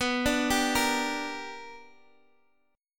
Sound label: B-Minor Major 7th Sharp 5th B down Guitar Standard; BmM7#5 arpeggiated; JGuitar